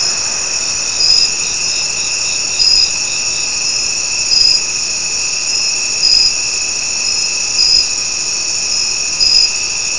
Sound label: cricket